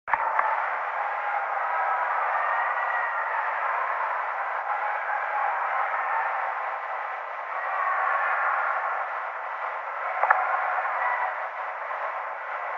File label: Jemez Mtns. New Mexico